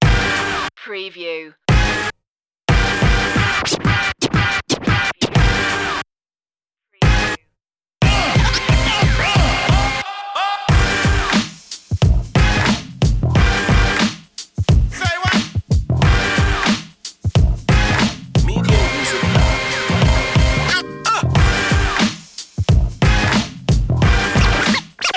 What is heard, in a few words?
boom bap